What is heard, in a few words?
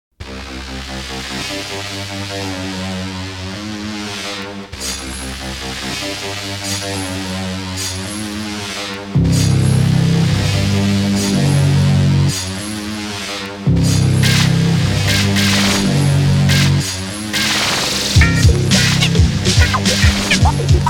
Scratch Tar